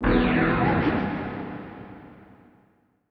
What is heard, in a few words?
SPACE FX 140; SpaceSounds